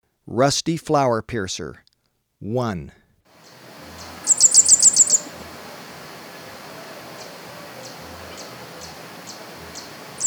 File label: Rusty Flowerpiercer, Birds of Ecuador; S. America